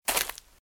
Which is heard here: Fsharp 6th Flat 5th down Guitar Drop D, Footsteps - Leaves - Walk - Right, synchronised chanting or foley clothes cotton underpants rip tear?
Footsteps - Leaves - Walk - Right